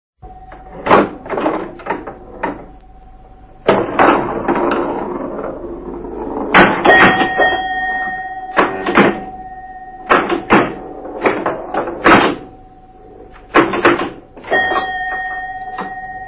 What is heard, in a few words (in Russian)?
igra v pinbol